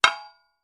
Metal Impacts, Dull; Dull Impact With Light Ring Out. - Dish Impact; pipes